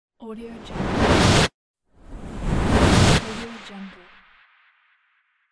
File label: reverse